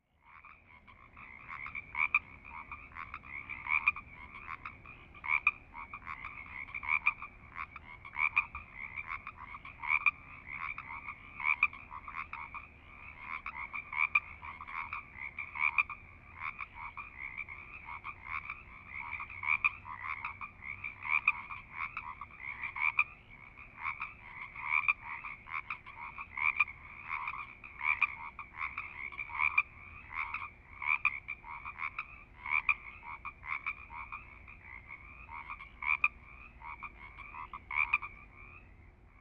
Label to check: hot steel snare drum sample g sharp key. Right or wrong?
Wrong